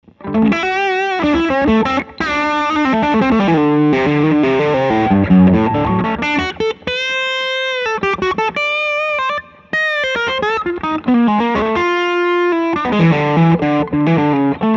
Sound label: drive; ET90